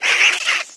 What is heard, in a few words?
Metroid Vox